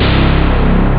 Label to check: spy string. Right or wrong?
Right